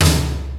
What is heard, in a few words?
RY-10, DRUMS + PERC, YAMAHA